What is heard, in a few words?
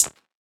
hh emo, Hats; drumkits; fl studio; goodtakimu drumkit